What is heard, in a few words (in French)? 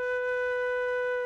note flute trav